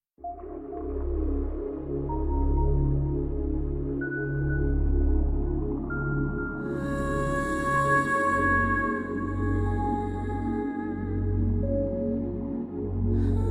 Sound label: Instrumental Version